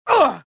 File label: pain; rebel